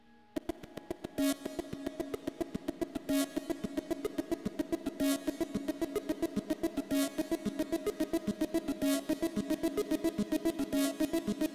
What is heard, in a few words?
osc stream